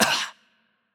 hurt light; VO; bloom minigame

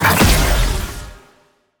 attack jump heavy; bloom minigame

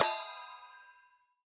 UI Event Combo Counter Score; bloom minigame

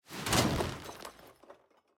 Box Pick Up

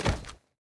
Jump Land; bloom minigame

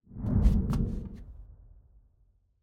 Puzzle Safe Enter

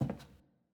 Footstep Wood

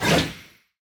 Attack Light; bloom minigame

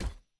generic button click

